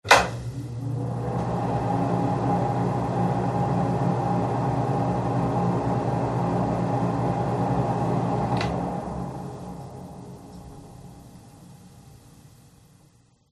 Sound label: bathroom air conditioner; fox audio